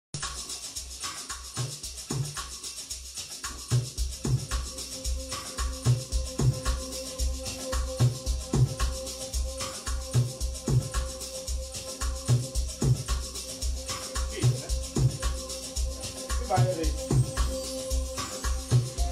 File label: Live Stream mix